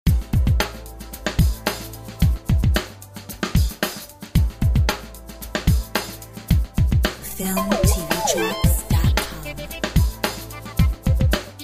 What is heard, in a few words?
Funky soul